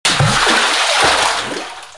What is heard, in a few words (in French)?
Plongeon piscine; bruitage; Liquides